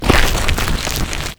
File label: dump smoke; Lucius